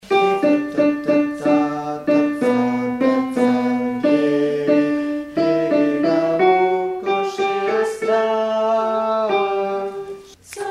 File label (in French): alto